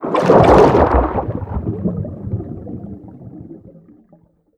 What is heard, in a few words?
player plunge rear; Call of Duty Modern Warfare